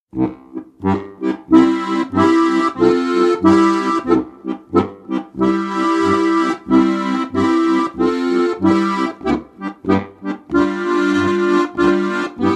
marsch

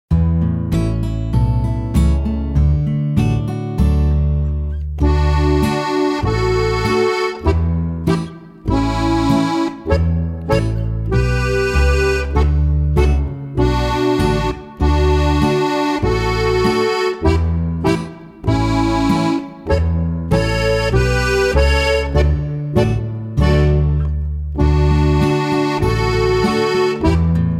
ennstaler polka 3 knopfreihe; Knöpferl Geschichten 1

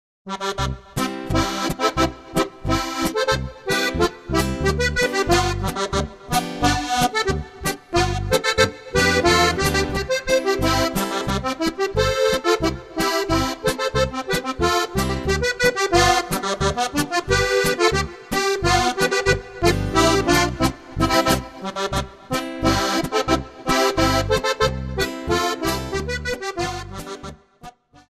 boarischer

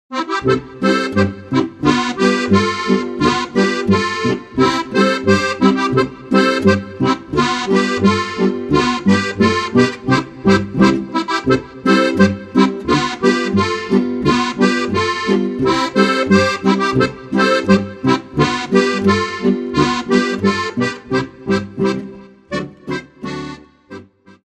boarischer

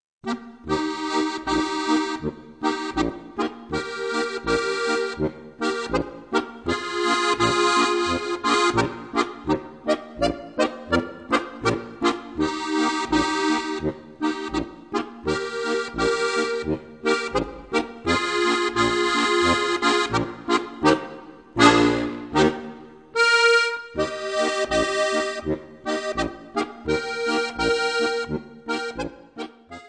marsch

